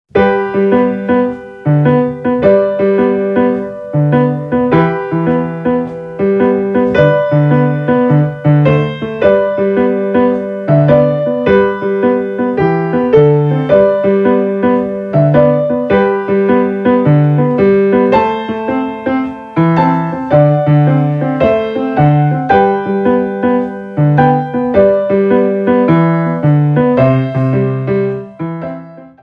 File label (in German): Stille Nacht Reggae; Besetzung: Klavier; Schwierigkeitsgrad: 2 - Einfach; Tonart: G-Dur